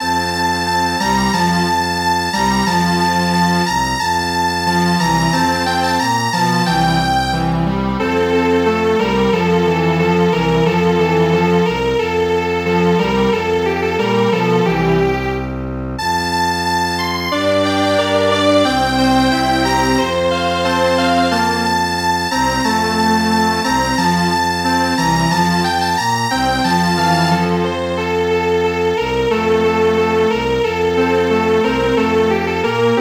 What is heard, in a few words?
Strings Quartet